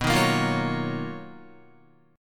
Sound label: B-Major Sharp 11th B down Guitar; strummed; 4F,4A,5C,5G,5C,6E; JGuitar